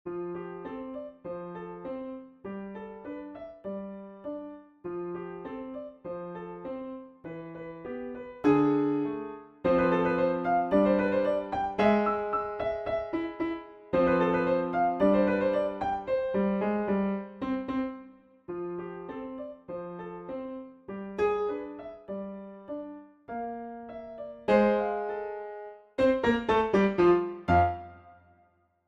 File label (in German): Faule Eier Klavier; Besetzung: Klavier; Schwierigkeitsgrad: 2 - Einfach